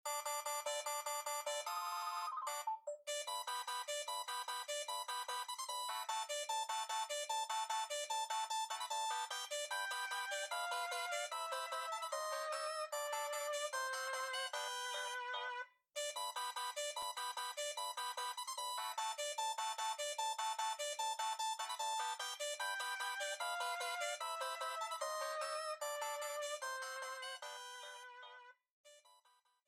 E-Mail; paper mario the thousand year door; from Princess Peach

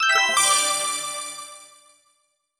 Ui Achievement; Devil Slayer Raksasi; PS4

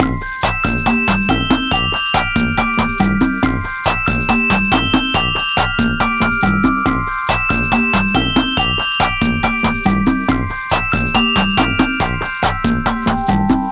Stuffed Animals, reveal music; Spanish

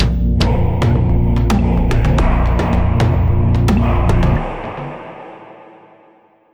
basicMood Cueva Bosque

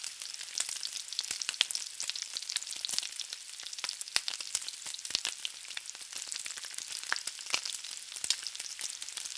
Frying Sizzle Loop; Prison Architect

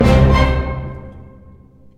C 16th Oct

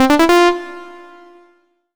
Solo Clean Sawtooth Positive; MUSIC EFFECT; startide

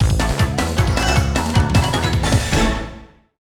Unused Fanfare; Ganbare Goemon Uchuu Kaizoku Akogingu; PlayStation

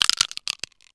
Sfx Bite candy; Charlotte's Web: Wilbur and Friends

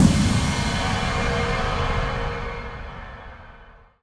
Hi Hit

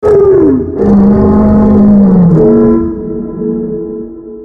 Alien Strengthens Power Source

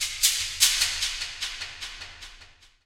Shaker; Forest

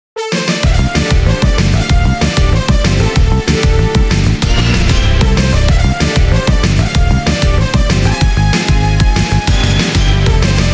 Stage Intro; Optic Circuit

